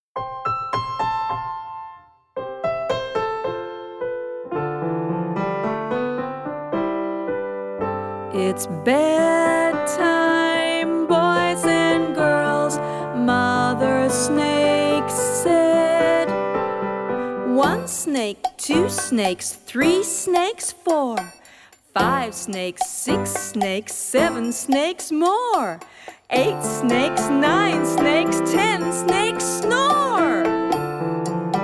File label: singing